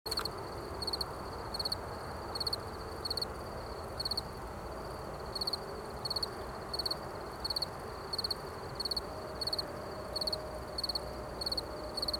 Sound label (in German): Feldgrillen; Roederaue Ottendorf-Okrilla